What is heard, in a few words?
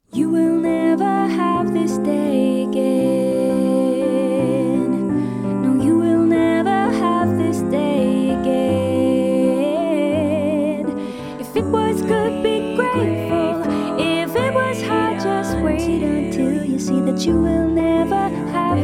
satb with piano